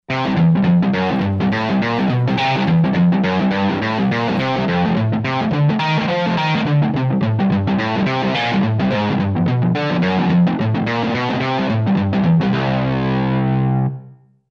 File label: Heavy Mute; Roland SRX-03 Studio SRX